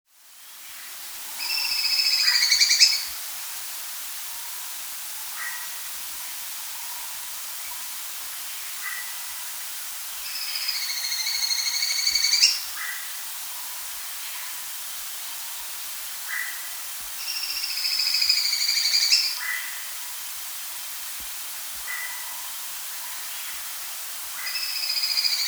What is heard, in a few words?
White-Throated Spadebill (Platyrinch, S. America